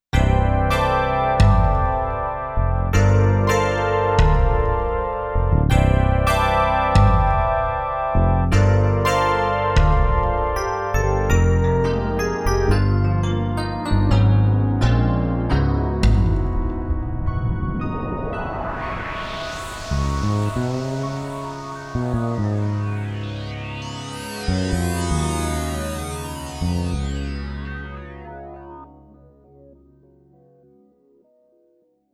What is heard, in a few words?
pop demo; xp-80; roland; Multi-patch demo; roland demo cd-3